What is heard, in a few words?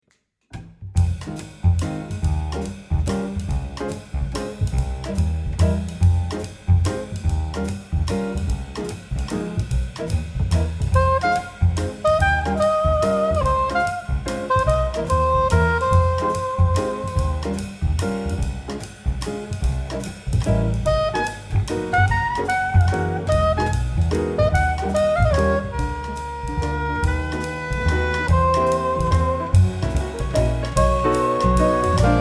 Jazz; Tatort